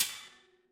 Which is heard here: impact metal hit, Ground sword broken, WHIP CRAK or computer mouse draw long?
impact metal hit